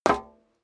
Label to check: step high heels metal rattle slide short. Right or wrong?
Right